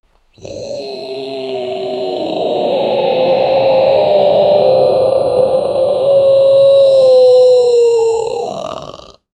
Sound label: demonic creature die sound